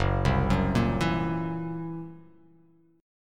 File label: F sharp 7th Flat 5th, F#7b5 chord; arpeggiated; JGuitar